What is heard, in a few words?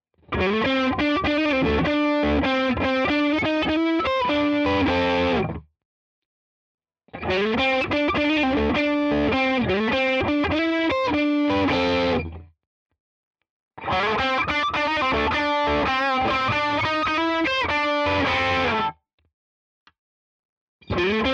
Schecter Exotic Ziricote crunch Lick A all positions